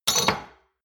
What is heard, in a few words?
shot glasses stack hard; soundpool